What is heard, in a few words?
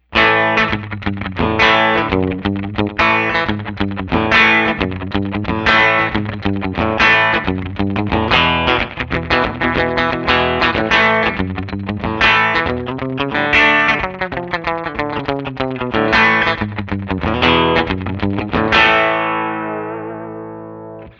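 Guild X160R Rockabilly Bridge, guitar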